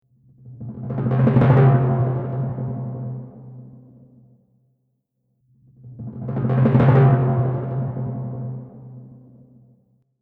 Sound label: Timpani Roll